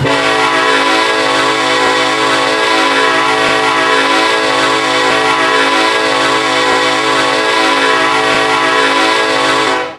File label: k5lla, horn; run 8 v3 horn pack